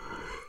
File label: breath